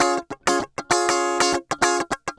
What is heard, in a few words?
100 BPM